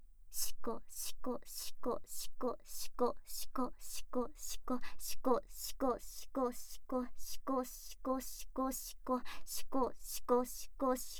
シコシコ音声

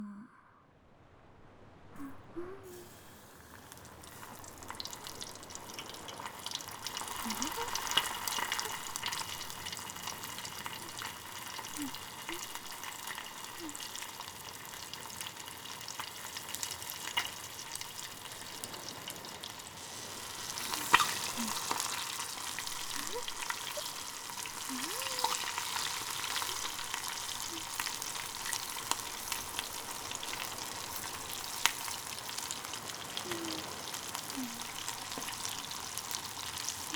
料理する＆波の音 セリフなし

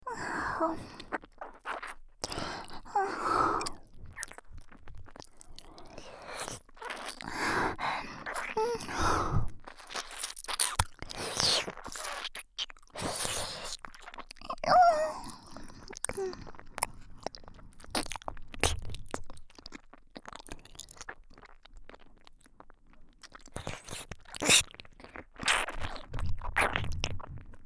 舌入れ耳舐め, ASMR

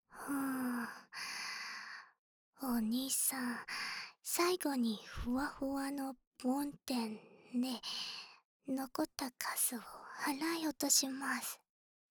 【右】梵天(終), 耳かき, ASMR; 耳かき小分けトラック

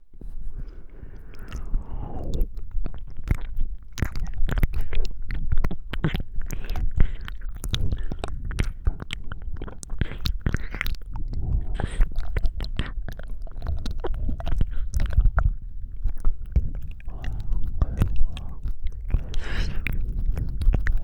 耳奥いっぱい耳舐め

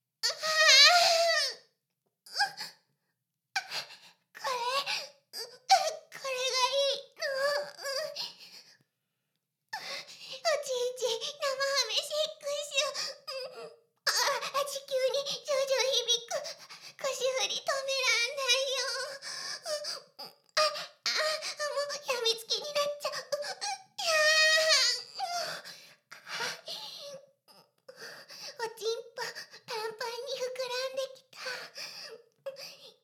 Ｈな音声; ダメなメイド

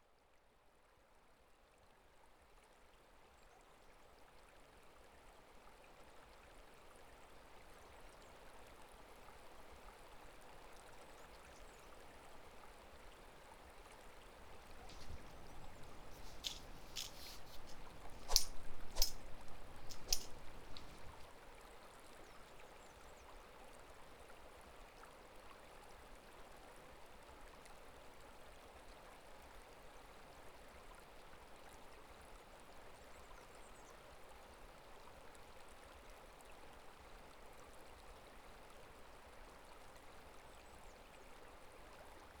川の環境音+フライフィッシングの音あれこれ; あやかし郷愁譚 ～マコ まことこま～; ものべの川で魚釣り; ASMR

